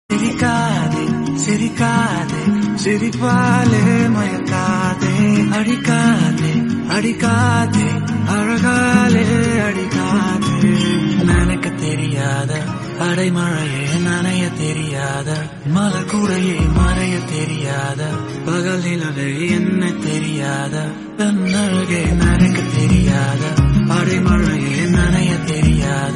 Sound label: TAMIL RINGTONE